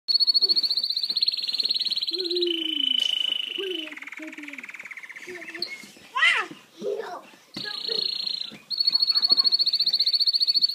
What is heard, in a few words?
Canary, Bird Songs